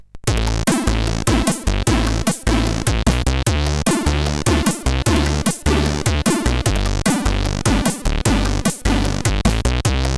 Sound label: CSG8580R5